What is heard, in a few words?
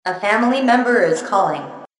Melody, RingTune